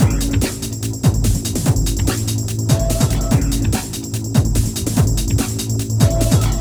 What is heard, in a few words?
Hardcore Breakbeat, 145 Bbmin; Big Fish Audio; Hardcore Breakbeat Action; Suspicious